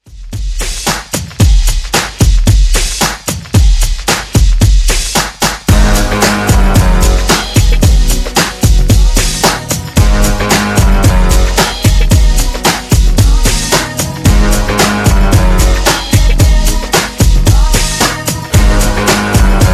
No Hype Re Drum 90s

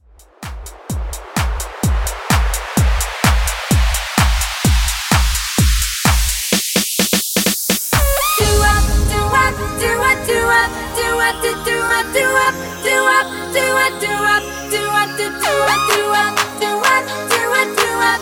Dance Redrum 128 Bpm; djyan

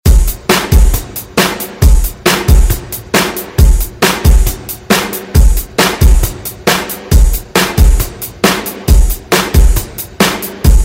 60s Ext Redrum

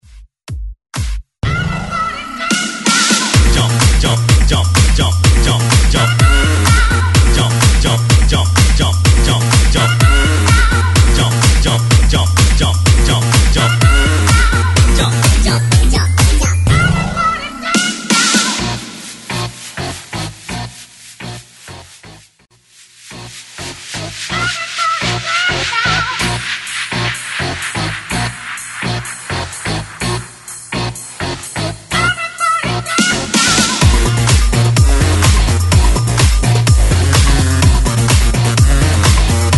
hyper bounce

